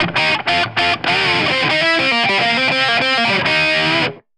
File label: Guitar Solo; соло